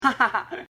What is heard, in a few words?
hahahaeh